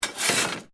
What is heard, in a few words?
METAL SCRAPE SMALL; Sound Effects:Materials